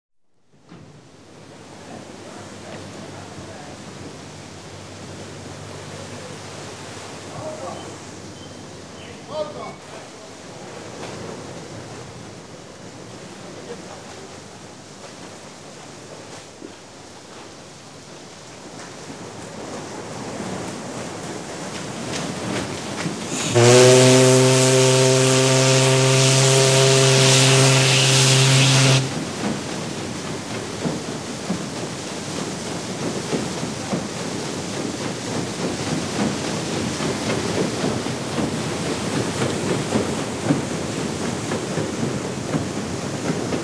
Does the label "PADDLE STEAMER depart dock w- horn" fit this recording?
Yes